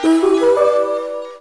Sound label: Speedup; Oh, Deer! Beta